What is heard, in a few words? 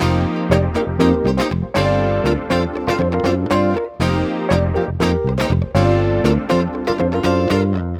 Backing, Nu Disco, 120bpm Cm; Zero-G; Construction Kits; Legacy